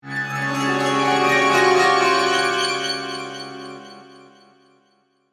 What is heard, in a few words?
Horror Musical, Chimes, Sweeper, Mysterious; Production Element, Imaging Element, Accent, Transition; Scary Tone; BlastWave